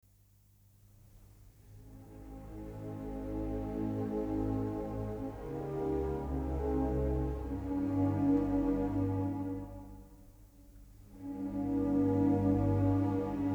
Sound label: Triple Concerto for Violin, Cello and Piano in C Major